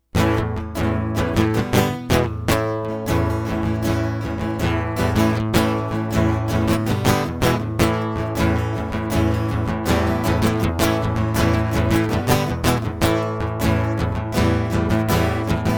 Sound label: acoustic